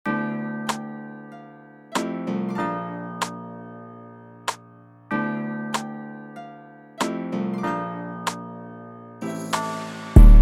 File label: detroit type beat